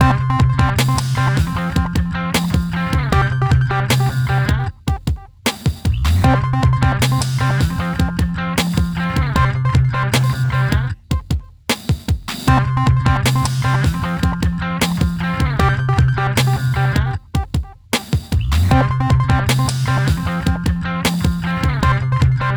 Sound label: Jingle Loop; pac man party 2010 wii gamerip